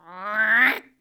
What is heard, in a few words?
bird misc; Angry Birds